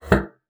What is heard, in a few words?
BASSOON; Xsample VOL-6